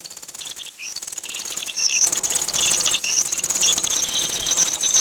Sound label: high pitched fruit bats squabbling; animals